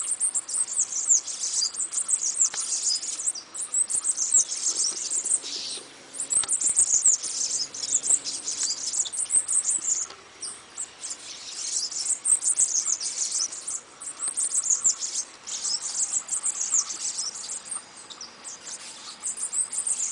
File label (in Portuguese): canto do sibite